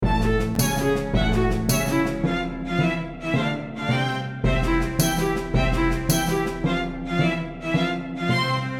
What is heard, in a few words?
Ending - Cabin (Orchestra); dragon fantasy book i the volumes of westeria